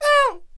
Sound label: Bomb Cannon Attack Vocals